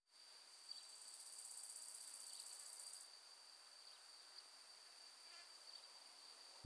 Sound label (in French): Friche Industrielle insectes grésillement; Rhinau